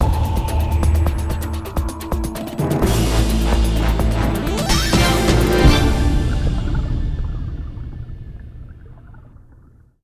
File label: Mission Failed; Metal Gear Solid 1 Twin Snakes